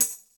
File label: tamb hit